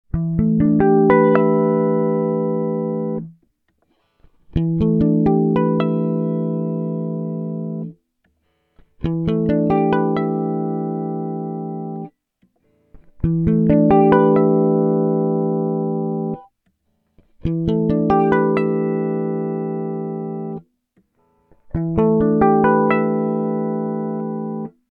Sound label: bass lab guitar